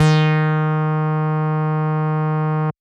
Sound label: DnB Bass D#3, drumnbass 170bpm; musicradar; Bass A Multis